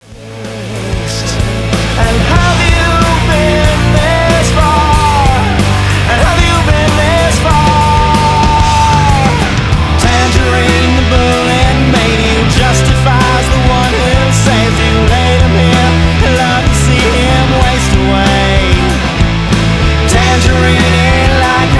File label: music moist tangerine